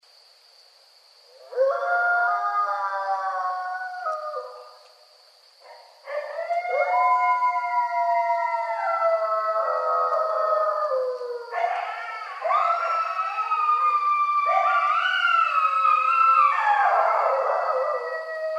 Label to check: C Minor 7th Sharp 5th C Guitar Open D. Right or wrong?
Wrong